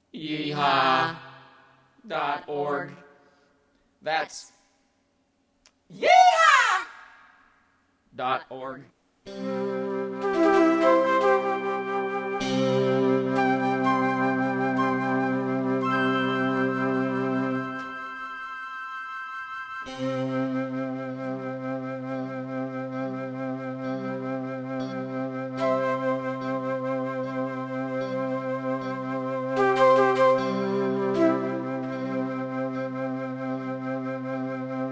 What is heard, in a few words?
flute